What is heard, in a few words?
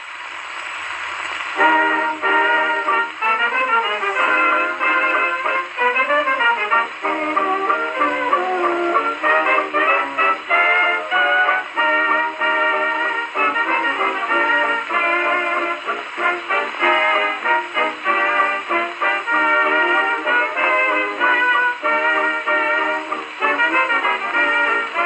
Great Victor II with wooden horn